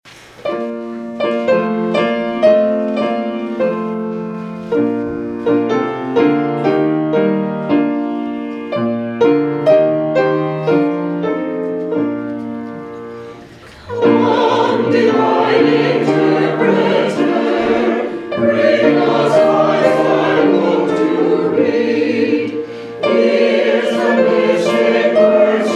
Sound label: Hymn; Come, Divine Interpreter